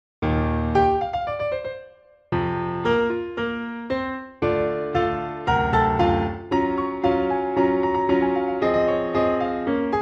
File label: piano ver.